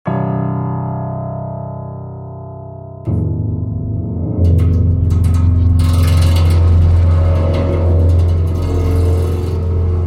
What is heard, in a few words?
piano strings; AudioAndroid